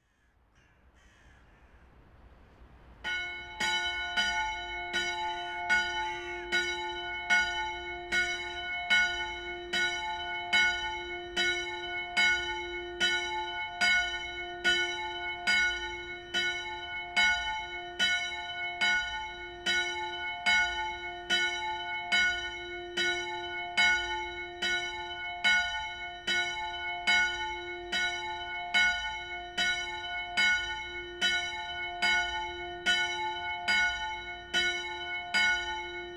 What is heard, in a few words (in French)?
cloche; chenebougeries